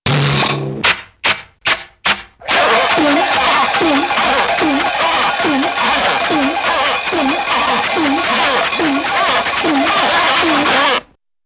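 Funny Engine Starts with sputter & Runs Steady, cartoon; Hollywood Edge; Cartoon Trax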